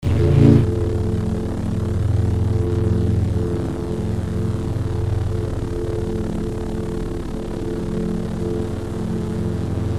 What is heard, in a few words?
Double Bladed Saber Sound; FXHome